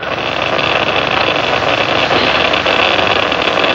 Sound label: Ancient Noise FX, Industrial